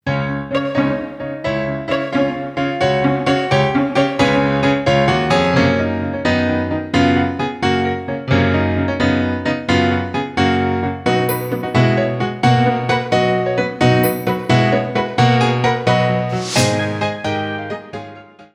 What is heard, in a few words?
Medium jumps, Ballet